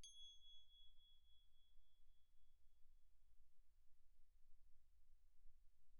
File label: crotale Ab7 pp; Percussion; crotales